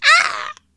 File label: Harp Groan